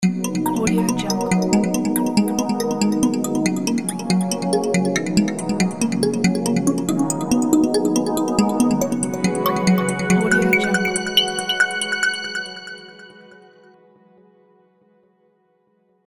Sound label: intro tv news